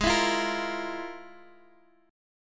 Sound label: F Minor Major 11th